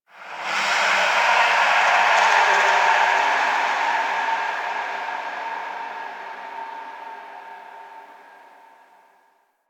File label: Factory Texture, Ambience; Warframe; Quests; TheNewWar; Zariman